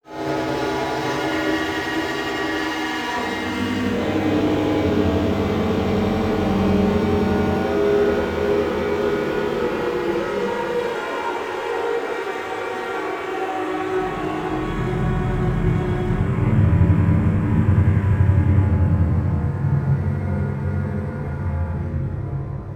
Title Transition Evolver Long; Production Element

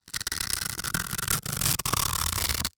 Industry Tool Sandpaper Grind Slow

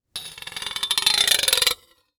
Foley Metal Scrape Clicking

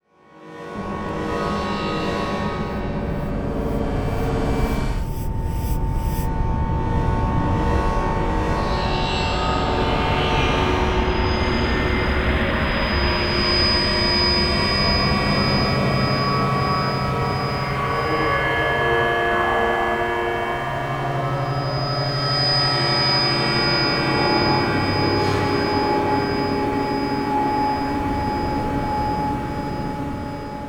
Reverse Alien Whine; Adobe; Production Element; Imaging

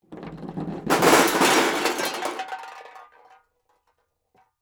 Rock Roll Down Wood Into Metal Pile; Crashes

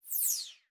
Multimedia Internet CD-Rom Flash Hi-Tech

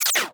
Science Fiction Sci-Fi Electronic Laser

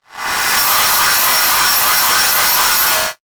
Title Transition Communication Distortion; Adobe; Production Element

